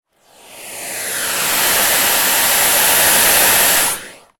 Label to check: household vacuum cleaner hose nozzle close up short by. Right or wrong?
Right